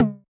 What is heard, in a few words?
Tom, Yamaha DJX 2B